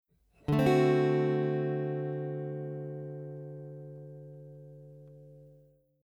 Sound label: Chord Types D7 Example, guitar